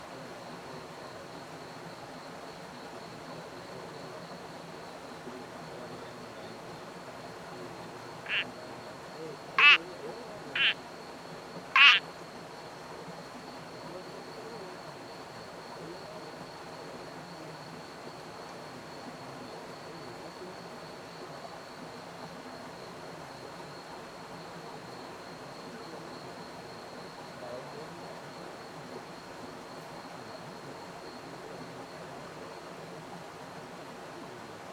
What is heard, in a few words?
Uperodon obscurus